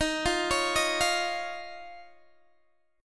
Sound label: Eb 7th Suspended 2nd Eb, Guitar DADGAD; x,18,15,18,18,15; arpeggiated